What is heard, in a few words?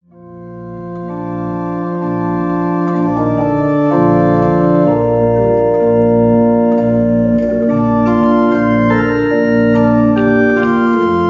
Jazz-Pop